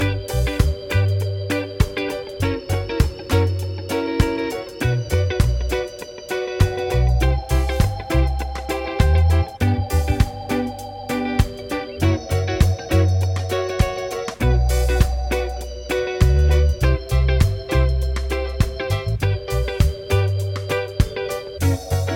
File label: progs with applied chords